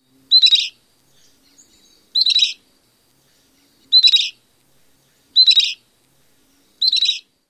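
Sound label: calls, bird; AZ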